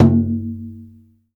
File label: GENDANG A3, DRUM; Spectrasonics; Heart of Asia